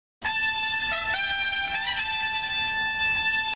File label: dragon dagger